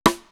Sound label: Snare, Drum One Shots; AMG - Coldcut's Kleptomania VOL-1